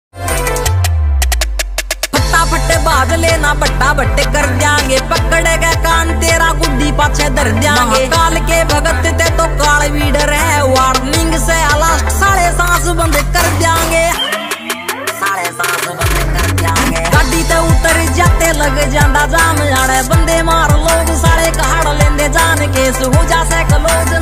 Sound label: haryanvi ringtone